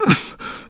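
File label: land medium; Shadow Man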